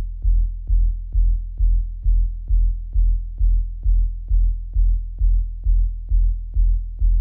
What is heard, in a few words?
Bass, 133BPM, Dark Analog